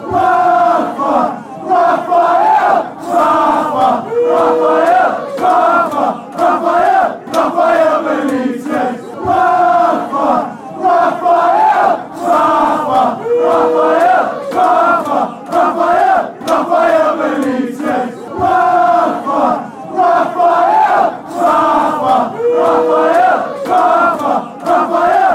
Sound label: fanchants